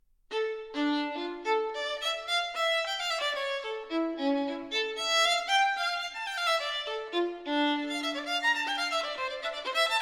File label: violin partita in d minor gigue; violin partita no. 2; bwv 1004 iv